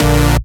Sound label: Synth Cuts; Vengeance Samples; VES1 Instruments